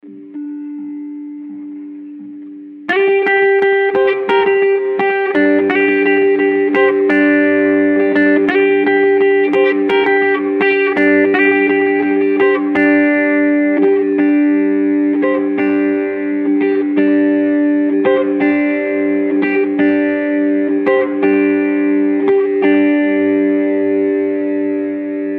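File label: G 170 guitar riff trash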